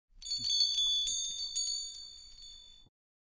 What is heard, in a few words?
bells